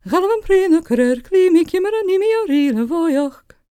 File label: GAELIC, World Voices, GAELIC FEM